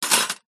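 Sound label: Silverware Drop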